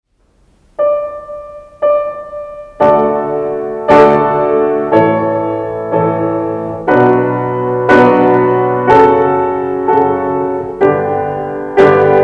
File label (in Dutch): akkoorden 2 rondes en stop